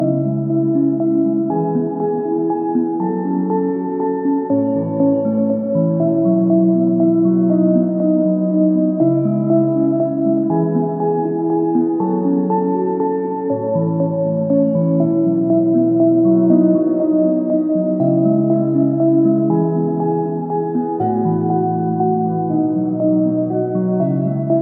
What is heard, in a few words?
Calm Waltz; quern undying thoughts linux macos windows xbox one gamerip 2016